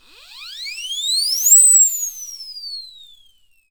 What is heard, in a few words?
WHSLSIRE, Synclavier Percussion World & Orchestral; ILIO; Synclavier Percussion 2